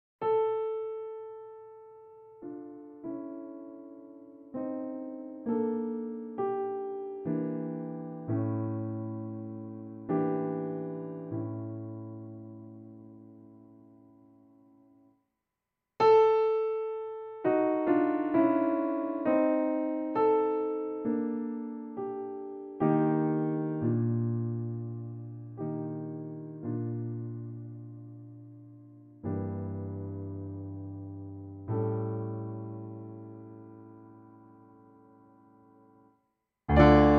Intro Piano Solo; FP Tosti, Italian Songs on the Poems of Gabriele D'Annunzio; La Sera; Francesco Paolo Tosti

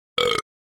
Belch Short Close Perspective; Human Sounds

